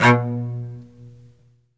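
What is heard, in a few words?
solo cello, strings; ILIO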